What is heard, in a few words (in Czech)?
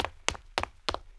dobeh; Mafie 1